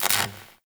Worker Welder Stop; Warframe; Grineer

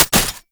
Mech, Weapons; Warframe; Braton

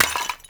Clay Pot Destroy; Gameplay; Awakening Remaster

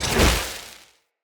Casting Device Cast Left; Weapons; Player

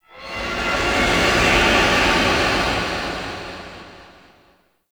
Rising Vocal; Sentient Devourer; Ropalolyst

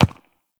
Ram Step Walk Dirt, Animals; Duviri

